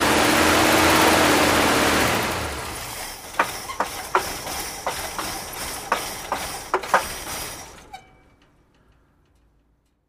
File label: Car Diesel Off